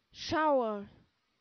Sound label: shower